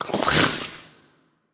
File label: Skel Archer Slurp